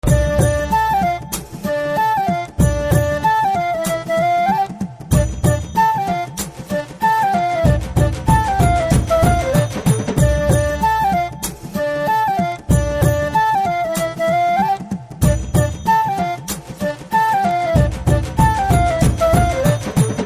BG Flute, Telugu Ringtone

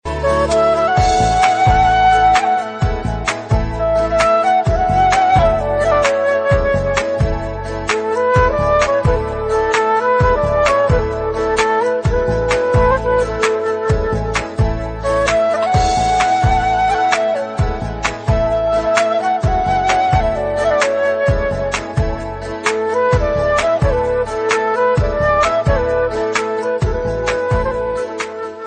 Playful Ringtone

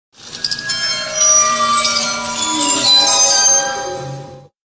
Scary Glass Sound Ringtone

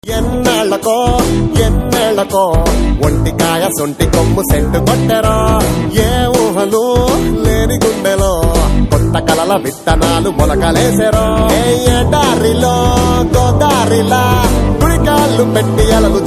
Telugu Ringtone

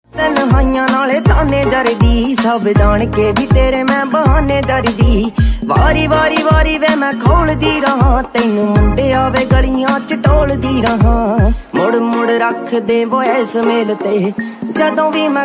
Trending Punjabi Ringtone

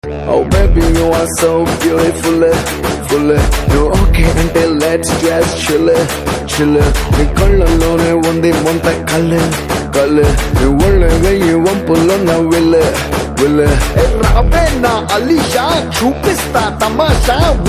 Telugu Ringtone

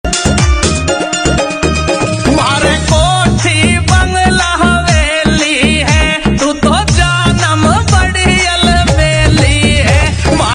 Ringtone

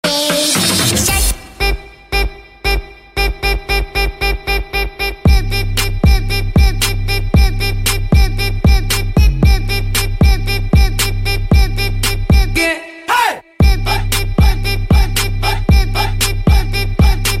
Trap Ringtone

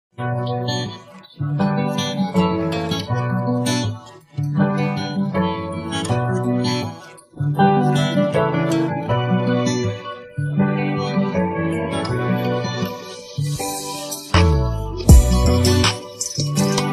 Instrumental Ringtone

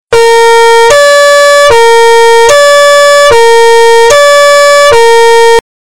Firefighter Siren Ringtone

